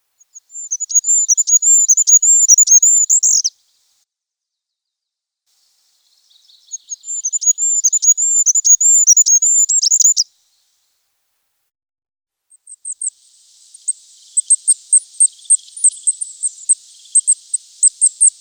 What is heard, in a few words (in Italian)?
Regolo Regulus regulus; Versi Animali; Il Forum della Fotografia Naturalistica